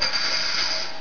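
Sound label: add points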